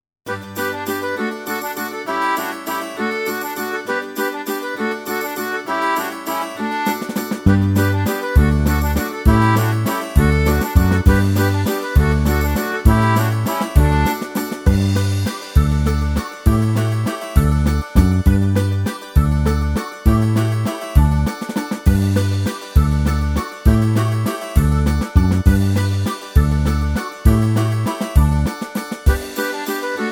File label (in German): Bewegungslied Karaoke